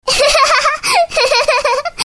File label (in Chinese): 闺蜜笑